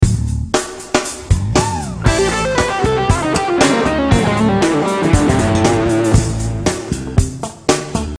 Lick

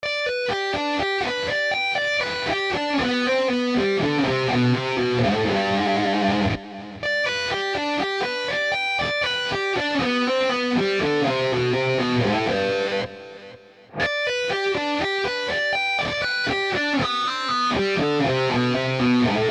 Sweep & Tapping

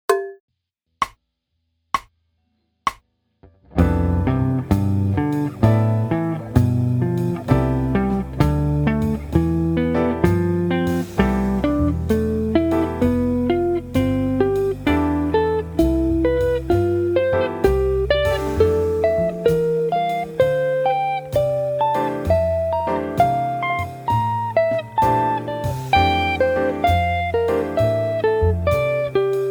major 7 exercise quinte consecutivamente, guitar

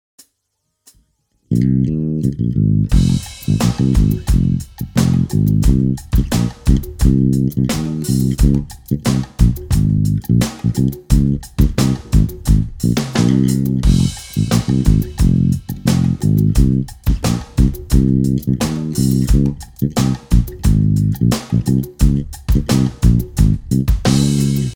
finger groove

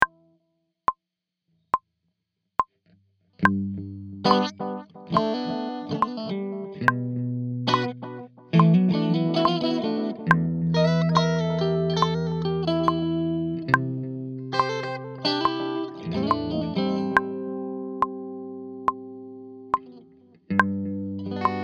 Lick

